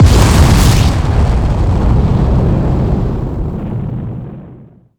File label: bomb